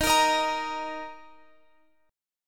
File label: D sharp 5th; D#5 Chord {X 18 20 20 X 18} strummed